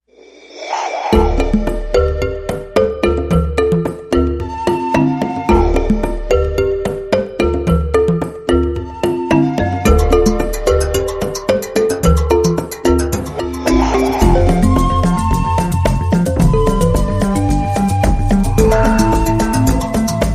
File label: Percussion Party